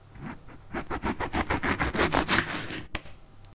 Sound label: cutting a lemon